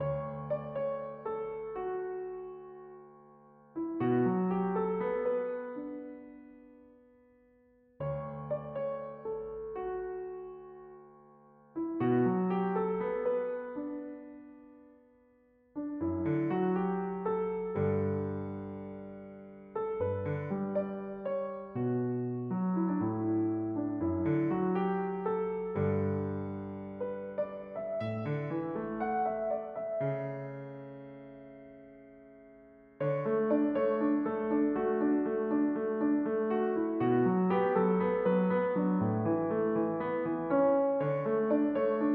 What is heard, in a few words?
Solo, piano